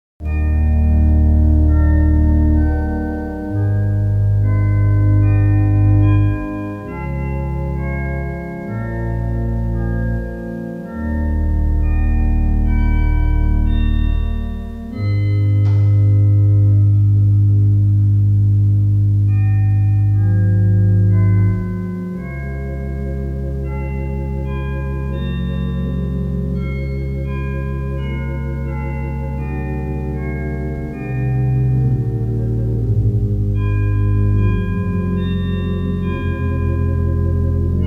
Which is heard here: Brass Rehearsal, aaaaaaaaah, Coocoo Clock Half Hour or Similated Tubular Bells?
Similated Tubular Bells